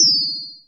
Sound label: BELL; AMG - Vince Clarke Lucky Bastard